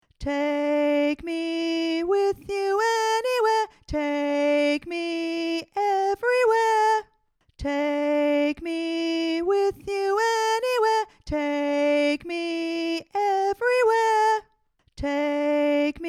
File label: Alto, community choir